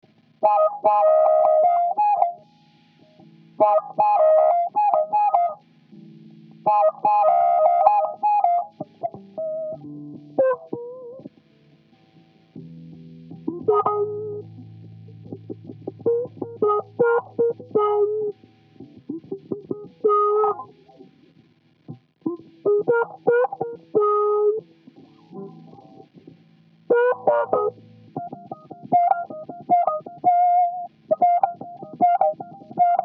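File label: F 77 Midwinter guitar impro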